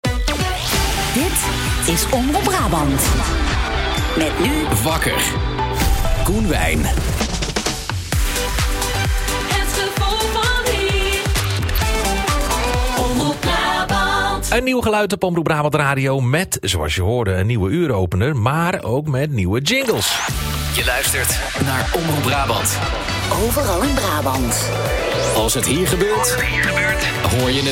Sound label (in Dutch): nieuwe jingles; Omroep Brabant; demo; audiovormgeving